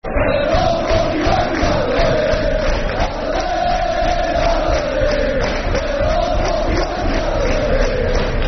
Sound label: At The Lane, Football Chants; Sheffield Wednesday Swfc